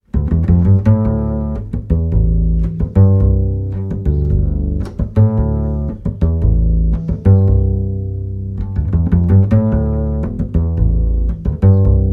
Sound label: solo inspiration